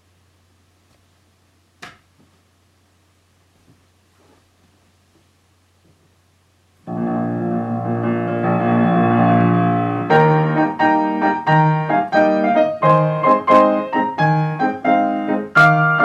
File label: Pour O Pour bass; Pirates Penzance Sudbury